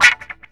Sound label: GTR WAH; FX GTR; E-Lab; X Static Goldmine 2; akai